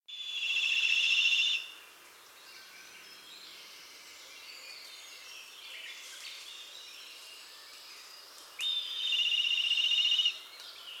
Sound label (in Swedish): Brun glada; Black Kite; Milvus migrans; Jacques Prevost